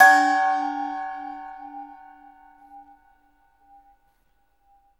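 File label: ART SHELL, percussion metallic; Rarefaction; Poke in The Ear With A Sharp Stick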